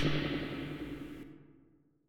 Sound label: Tails, Drum Bits, Verb Tails; layering